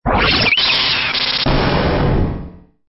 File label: Stinger; STINGERS